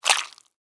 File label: Horror Squish Wet Juicy; Monsters (Sound Effects:Horror)